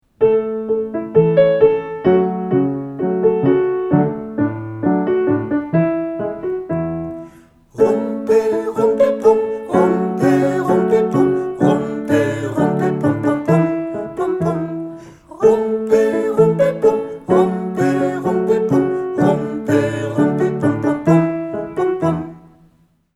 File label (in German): Melodie; Rumpelpum